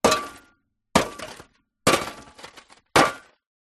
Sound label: charcoal piece large hit with metal shovel metal fire pit; zapsplat